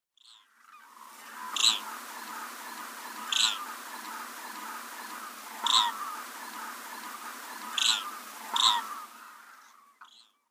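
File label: Hooded Crane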